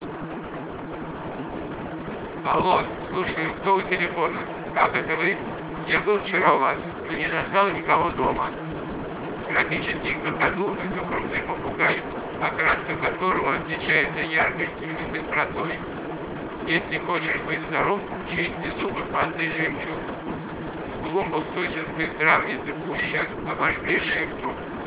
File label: car +06dB